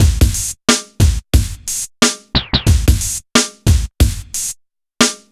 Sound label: BPM 90; AKAI S-Series CD-ROM Sound Library VOL-4